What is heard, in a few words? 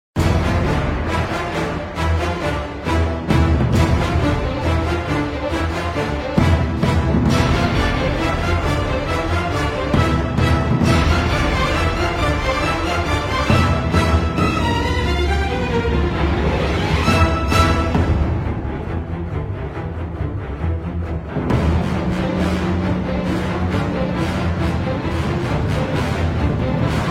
no choir version; God of War 3 Soundtrack